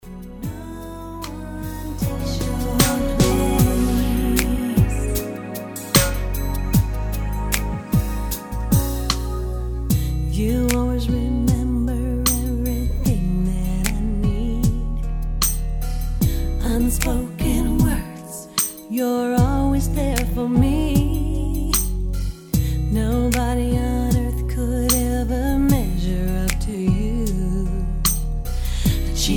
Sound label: Pop R&B Ballad